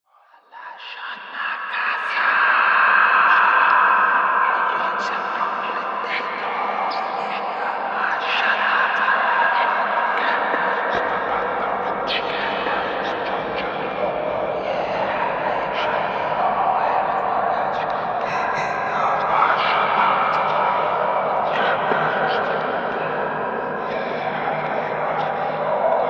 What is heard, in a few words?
Dark Evil Whispers, Scary Soundscape